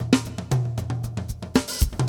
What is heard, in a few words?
metal